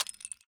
m24 eject, weapons

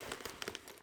generic cloth movement, weapons handling

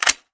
m4 release handle, weapons

